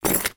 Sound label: household keys bunch down on cardboard box